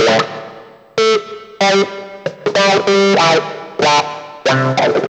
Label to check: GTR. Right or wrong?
Right